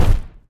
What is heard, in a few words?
footstep